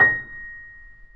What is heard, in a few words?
PP A#5 off, Grand Piano off mic; AKAI S6000 CD-ROM - Volume 2